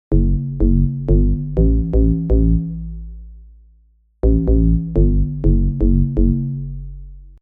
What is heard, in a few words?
Bass line